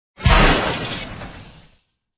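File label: Zeppelin Smash; btd4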